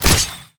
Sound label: dark knife